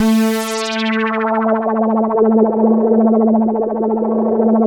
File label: JELLO, KORG DW8000, Vintage Keyboards; Big Fish Audio - First Call Vintage Keyboards